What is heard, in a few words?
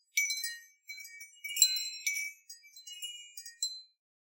Wind Chimes B